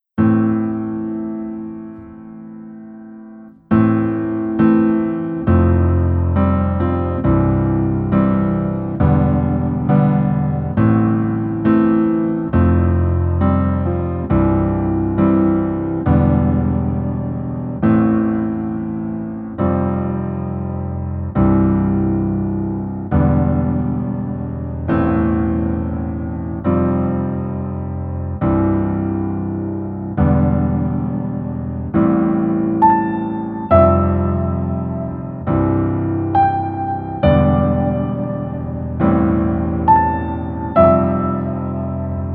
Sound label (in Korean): Piano ver -1키